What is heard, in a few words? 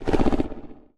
lurk, Angry Chimera Growls; TBs Angry Chimera Growls